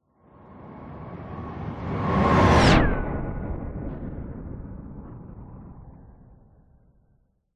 science fiction spacecraft powerful flyby whizz past fast; zapsplat